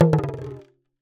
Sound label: Talking Drum Bounce; Percussion Samples